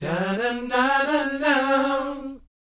BOYZ, R&B Dance; Spectrasonics Vocal Planet

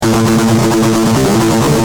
G Jam, Guitar; Industrial

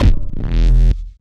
Kibas Bass; Sonic Foundry (Sony Creative Software); Knutrix Drum & Bass

